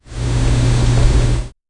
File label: sitting on sofa